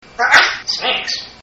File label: sneeze